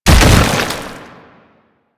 Bodyfall Light; Warframe; Proto Infested

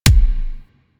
Pistol Punch Alt, weapons; Warframe; Thanotech

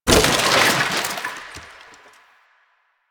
Wood Table Impact; Warframe; NineteenNinetyNine

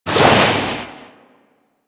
Shark Tail Move Fast

